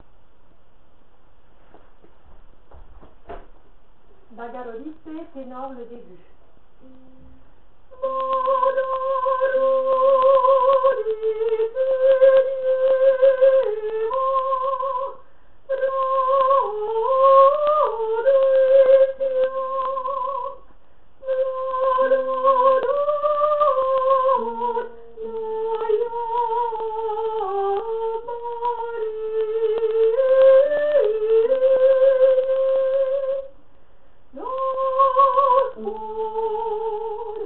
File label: Tenor; bogoroditse